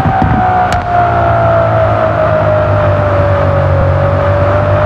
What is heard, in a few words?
rev down, vehicles, laferrari; tralalelo ferrari; sim fphys fer laferrari